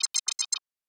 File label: Accent, Interface, UI, Data, Chatter, Clean, Crystal, Low